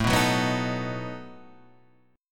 A 6th Suspended 2nd Sharp 11th, guitar; A6sus2#11 Chord {5 2 4 4 4 5} strummed

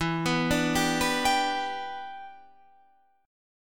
E Minor 7th, Guitar, Drop D

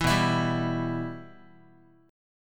B Suspended 4th Sharp 5th down Guitar; Bsus4#5 strummed; Bsus4#5 chord; JGuitar

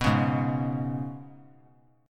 Ab Minor Double Flat 5th down; Abmbb5; strummed

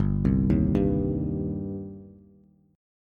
G Suspended 4th Sharp 5th G, down, Bass; Gsus4#5 arpeggiated; JGuitar

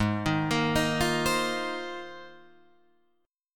Ab 6th Add 9th Ab Guitar Drop D

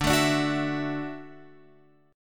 D-Major Add 9th D down Guitar Open F; strummed; JGuitar

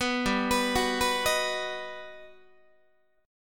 G Augmented Major 7th, Guitar

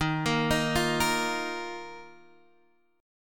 Eb Suspended 2nd, Guitar Half Step Down; chord

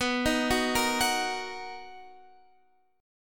D sharp Minor B, Guitar

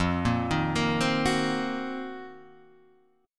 C Minor 7th Flat 5th F, chord; Cm7b5/F arpeggiated; JGuitar

